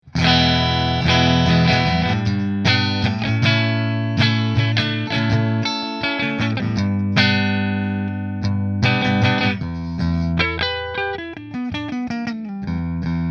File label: Nola Voodoo Neck Split Fender, guitars